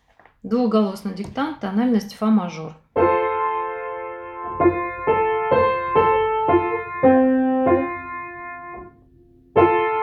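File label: two-part dictation F major; dvuhgolosnyj diktant; 8 klass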